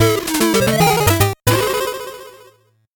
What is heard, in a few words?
8bit jingle fast